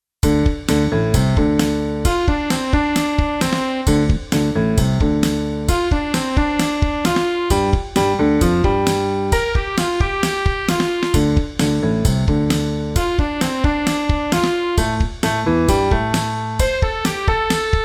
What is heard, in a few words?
Bass Line Blues